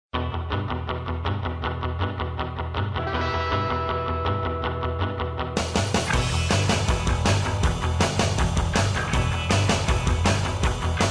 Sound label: surf